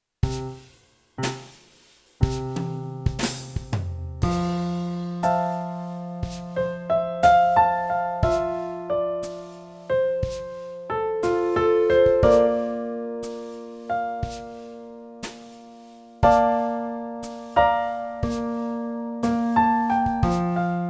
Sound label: Long notes improvisation F